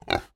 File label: animal pig kunekune grunt